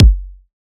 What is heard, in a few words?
kick sticky; Drum Sample Pack; One Shots; Slate; SEISMIC